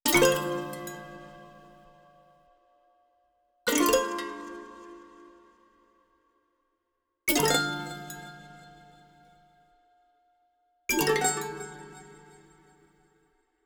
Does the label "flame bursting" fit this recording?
No